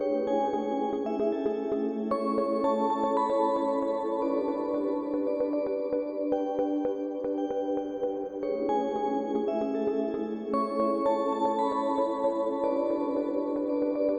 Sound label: A seamless heavenly / dreamy sound loop